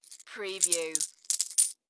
Coin Set Down In Hand

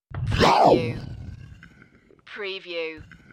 Monster Growl

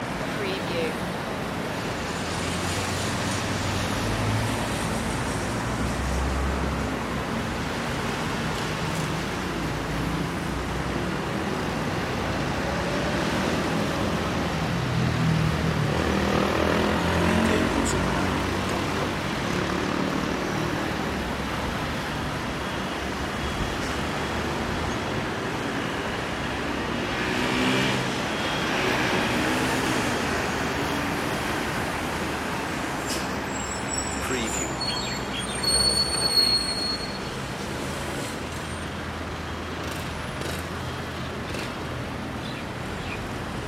rooftop city traffic loop

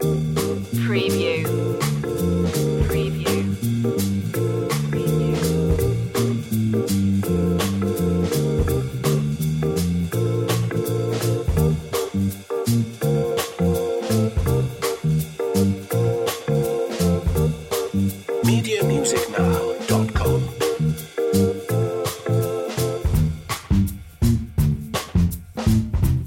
scooter ride loop

